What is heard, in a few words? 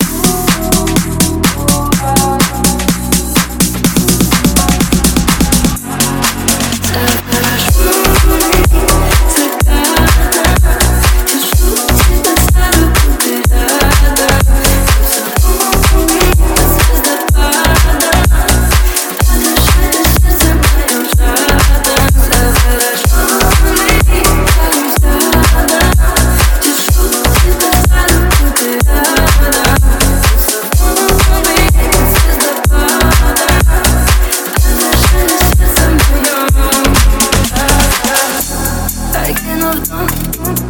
remix